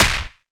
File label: common extract